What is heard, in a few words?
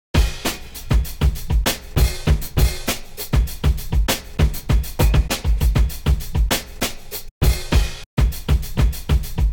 Drums, breakbeats; Ableton; Breakbeats by KutMasta Kurt